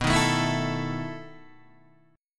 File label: B 11th B Guitar DADGAD, chord